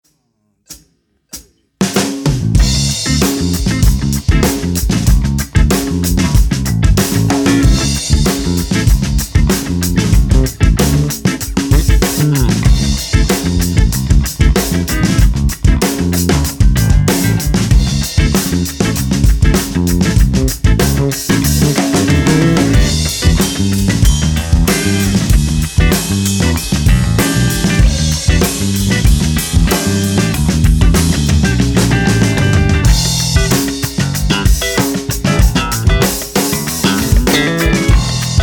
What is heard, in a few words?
drums bass guitar